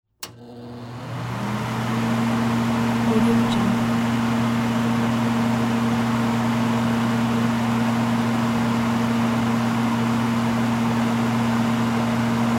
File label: hood vent fan two speeds